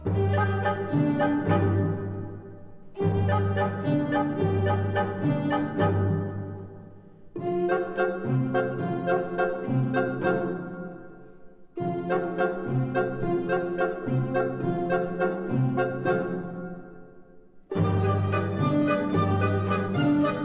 bolero, orchestra

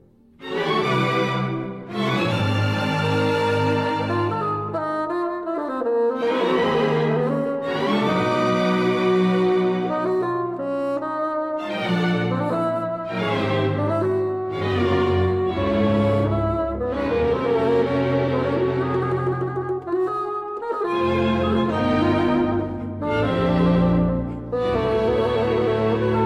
concerto for bassoon and orchestra

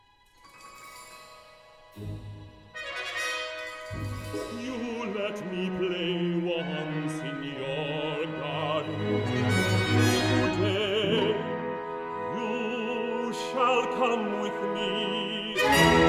symphony for baritone and orchestra

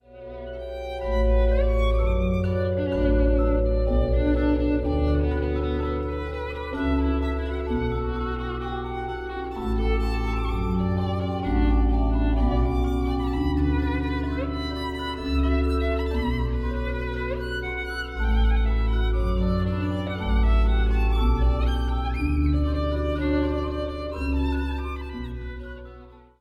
suite chaconne

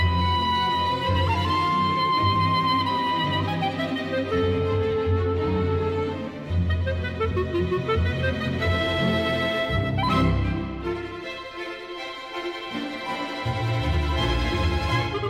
clarinet concerto III

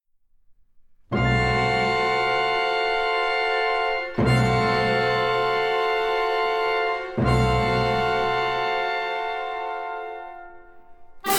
fanfare

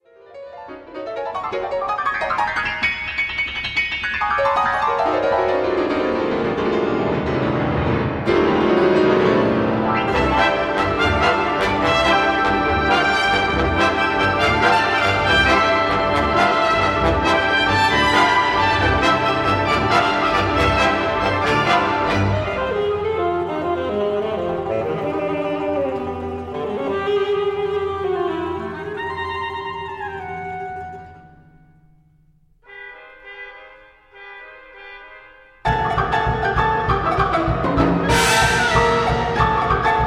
a jazz symphony

